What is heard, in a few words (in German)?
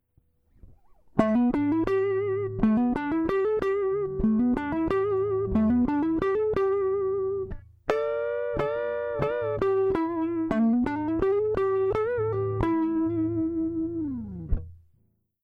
E Guit Clean DI starke Kompression